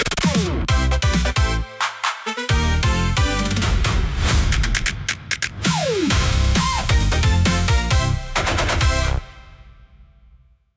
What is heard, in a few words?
nakama tojo sanjo jingle; free jingles